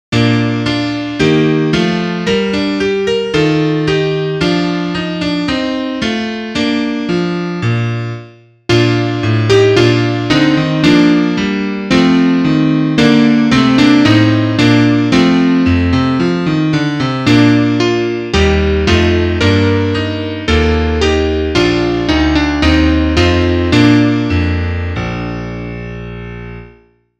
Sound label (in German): Klavier; alle jahre wieder